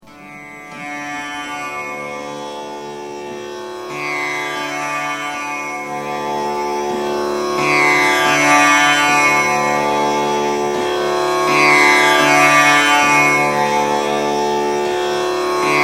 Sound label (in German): Tambura Klaenge